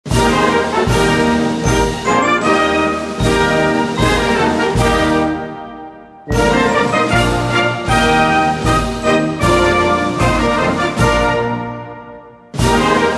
Bayerischer Zapfenstreich

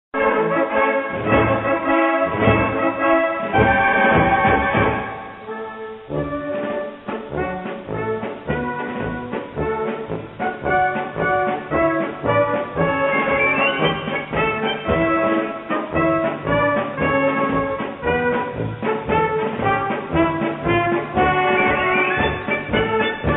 Rainer-Marsch main motive

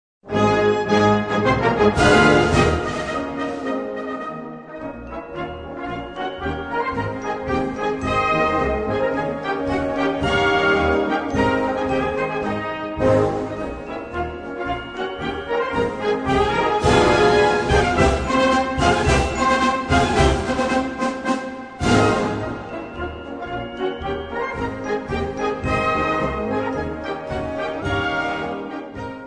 Marsch; deutsch; Grossherzog Friedrich von Baden

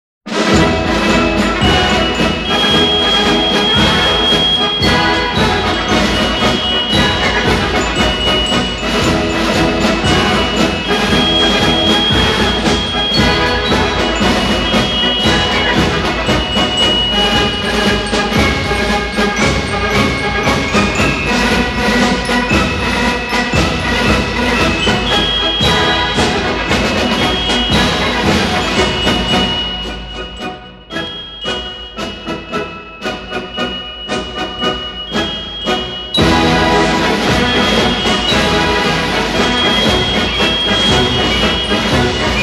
Marsch Des Yorckschen Korps

